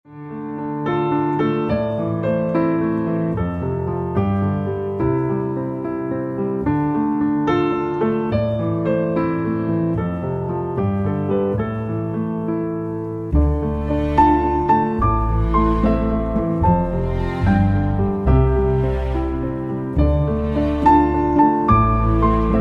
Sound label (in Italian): danza in re minore